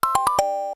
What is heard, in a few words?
Objective Open; Open and close the objective screen